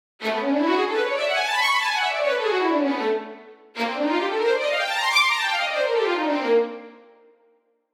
fast violins; strings runs

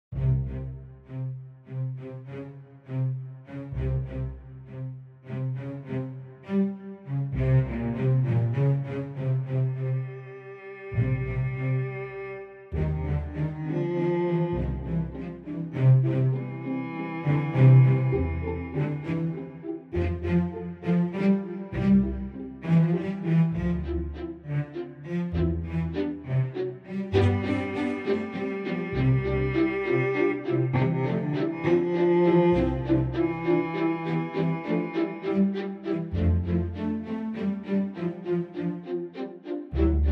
Strings; Kirk Hunter Spotlight Strings; Spaces

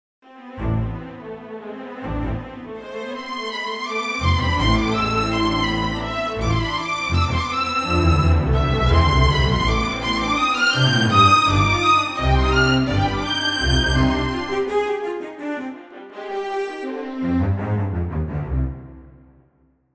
test solos, strings; Harry Potter

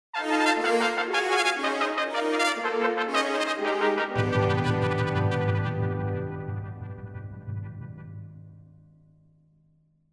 Trumpets fast staccato; super fast passages; CB pro; Sample Modeling

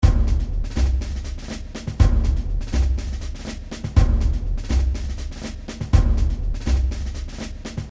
Marching, pop military drumming

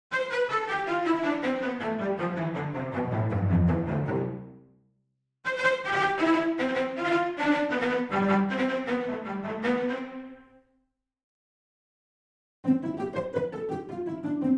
Concert Celli; Kirk Hunter

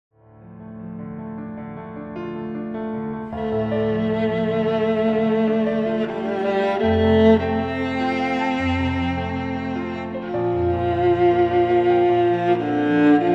viola, solo viola

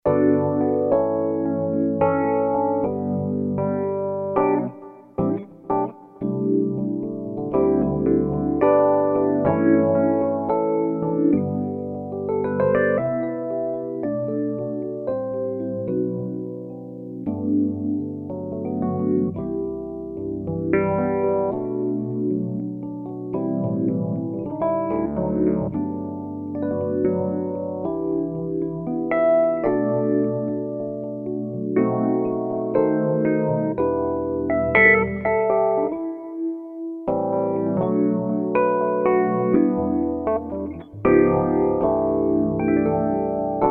Sweet Tines with phaser, Rhodes; Rhodes module

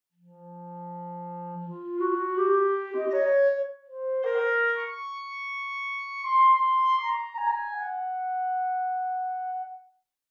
Clarinet; VSL; SPAT; 3m

